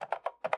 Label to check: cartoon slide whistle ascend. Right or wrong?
Wrong